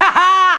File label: "ha ha", Voice; ooh ahh